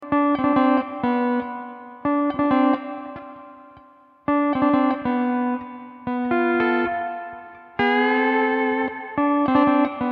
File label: hawaiian piece